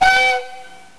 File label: Whistle